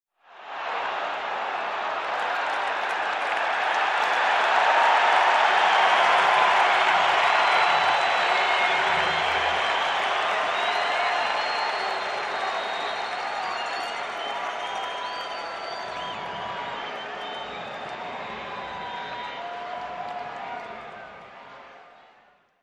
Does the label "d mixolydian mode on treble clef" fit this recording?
No